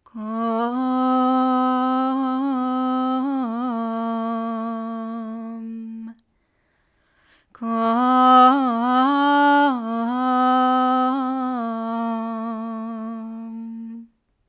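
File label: female vocal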